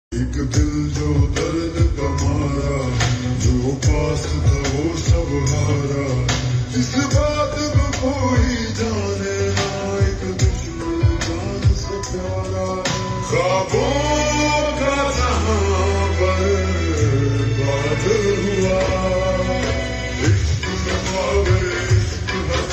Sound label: Ringtone Slowed Reverb